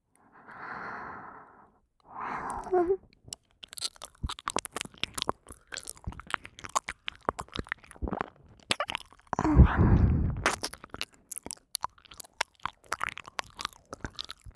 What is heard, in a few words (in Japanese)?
耳奥舐めしゃぶり（左）